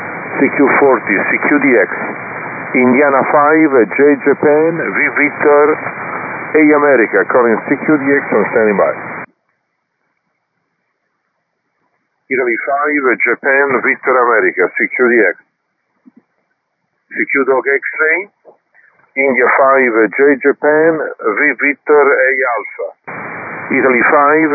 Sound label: Audiosample dnr ssb; AIRSPY HF PLUS